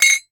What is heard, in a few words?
Metal Clang; 1001 Sound Effects